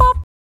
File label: Bop Kick; Sonic Foundry (Sony Creative Software); Pocket Diva World Class Vocal Samples